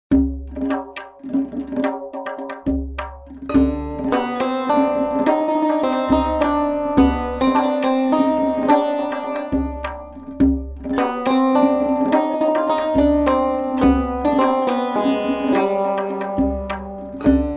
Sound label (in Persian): تار و تنبک